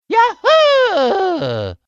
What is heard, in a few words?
wahooooooooegh